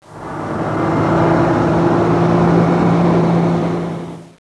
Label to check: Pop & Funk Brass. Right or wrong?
Wrong